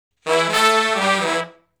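Shuffle Blues Riff (E) unison, Horncraft for R&B Sections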